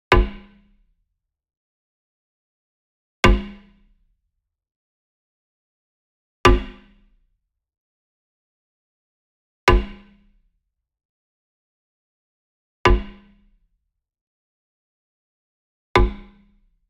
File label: WOOD PERCUSSION Log Drum Hit Near; boom library; Cinematic Strikes Construction Kit